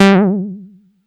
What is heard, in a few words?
Bass Juno G; Vengeance Samples